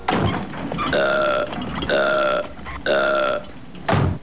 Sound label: clock strikes three; Duff